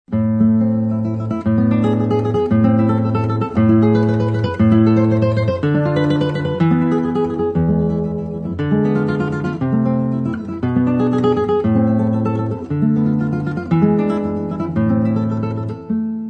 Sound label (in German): etuede a moll; matteo carcassi